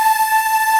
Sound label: Ending loop